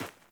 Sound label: Sandals Run Rock, Footsteps; Characters; Player